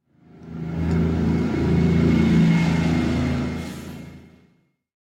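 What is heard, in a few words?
bus passing fade out; anemoi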